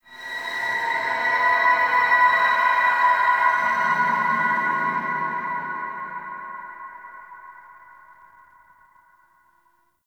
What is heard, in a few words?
Ambient Texture; Warframe; The War Within